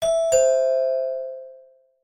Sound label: doorbell; Golden Crayon Sounds